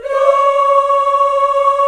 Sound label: VOX, African Aahs, African Las